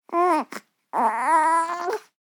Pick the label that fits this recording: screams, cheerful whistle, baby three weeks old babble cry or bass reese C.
baby three weeks old babble cry